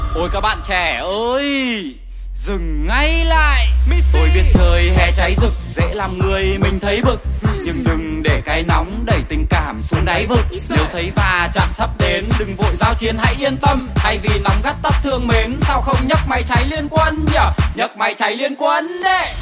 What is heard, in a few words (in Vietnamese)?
nhac chuong rap